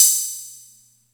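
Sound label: Atlantex Music Percussion Computer hi-hat open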